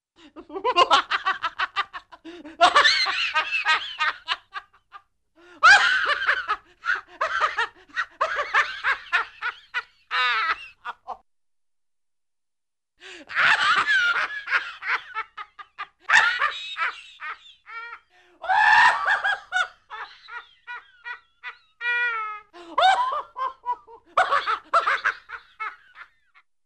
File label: Hilarious Man Giggles & Laughs Boisterously, Cartoon; Hollywood Edge; Cartoon Trax